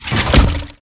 boombox; wilbur

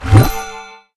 plant ready con chime

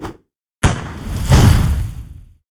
hero barrel; blackthorne

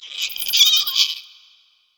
giant rats

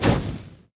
tower hatchery attack; sfx

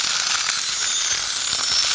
durax movement loop

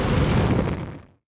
dragons hero ultimate end; sfx

